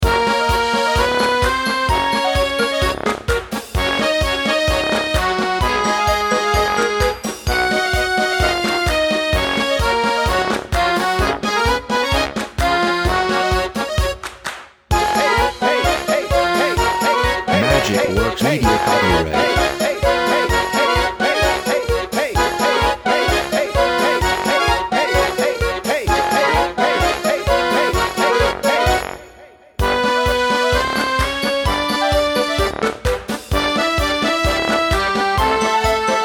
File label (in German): Volksmusik